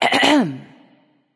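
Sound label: clear throat, female; smite; voicepack; hera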